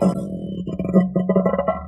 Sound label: fart medium